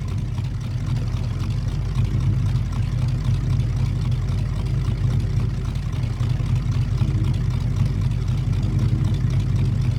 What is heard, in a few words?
Idle Loop, Truck; Nickelodeon - Destruction Truck Derby